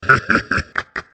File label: deep laugh; abilities